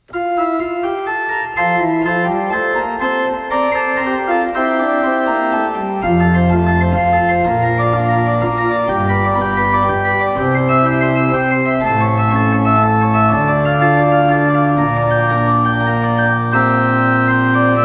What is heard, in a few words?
prelude fugue in F; JS Bach; BWV 556